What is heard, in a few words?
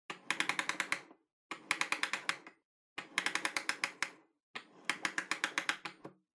Pendulum Clock Hardware Wind Up